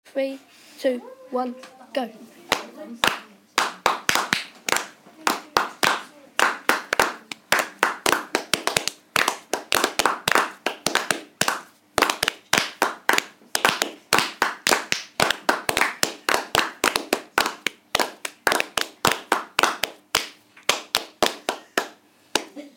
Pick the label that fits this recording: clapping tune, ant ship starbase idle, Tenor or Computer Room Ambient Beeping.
clapping tune